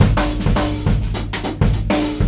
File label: DRUM